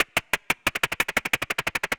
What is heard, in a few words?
Synth Rhythmic; Artes; Ableton; Glitch and Wash